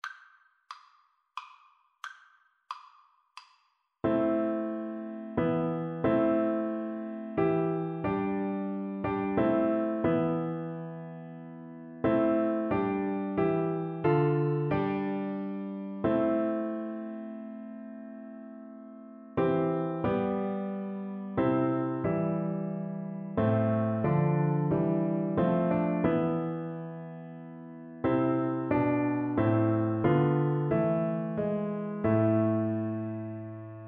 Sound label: coventry viola